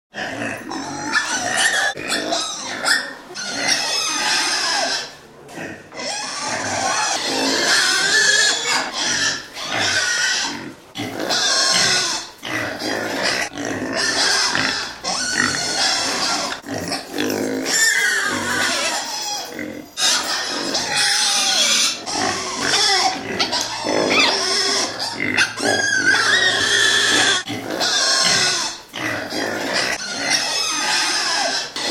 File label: Pigletts, Baby Piglets squealing inside barn.; Pigs; Animals